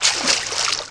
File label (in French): objet ds eau